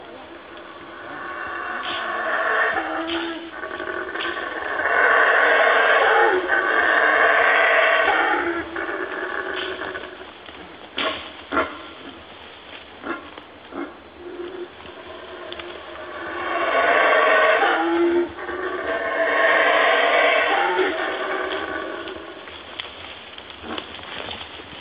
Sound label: Howler monkey